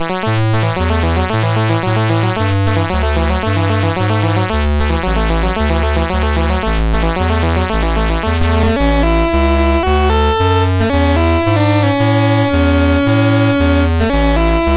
Ride the Train A, MOS6581R3

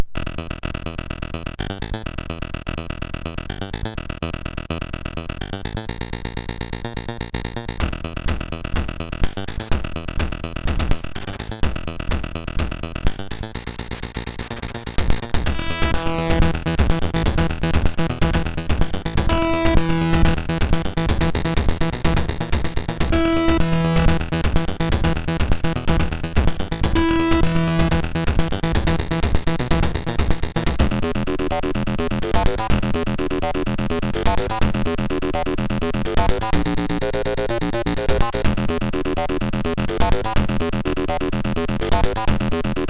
MOS6581R2, 8BitChiptune; Moldy Goat Cheese

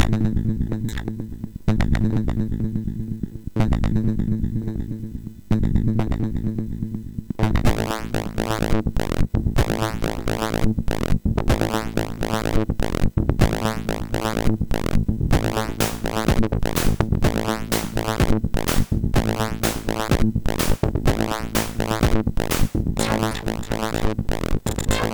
Distortion; HVSC

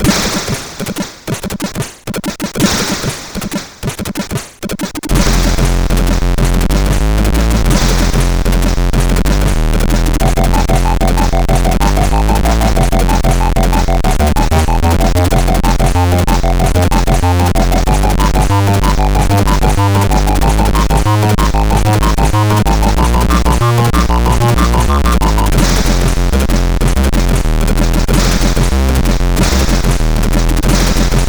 sid, CSG8580R5

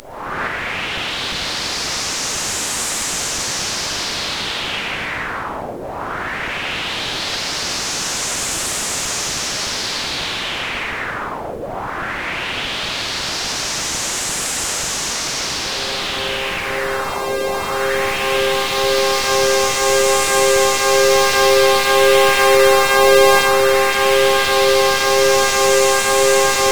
Techno; hvsc

